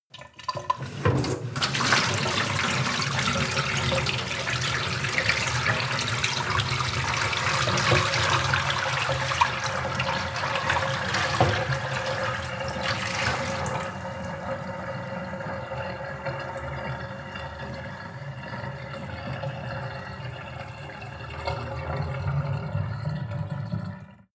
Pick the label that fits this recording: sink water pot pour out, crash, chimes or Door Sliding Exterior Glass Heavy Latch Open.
sink water pot pour out